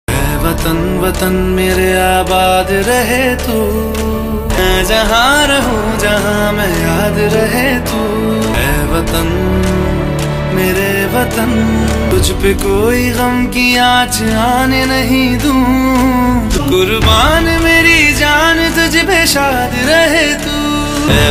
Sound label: Male Version